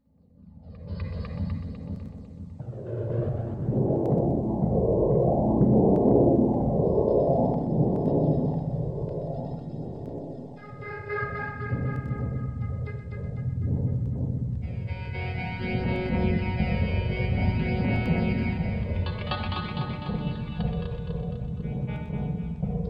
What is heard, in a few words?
Db Diminished 60, Deconstruction